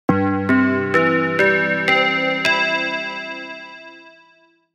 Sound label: Techno Modular Bell, jup8